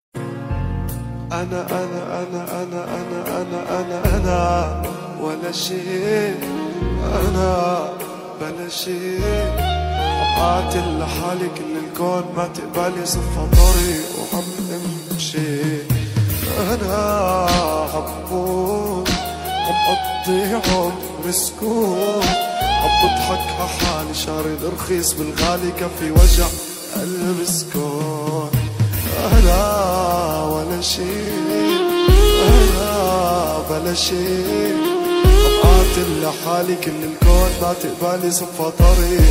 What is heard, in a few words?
arabic song; tik tok trend music